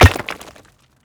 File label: stone impact small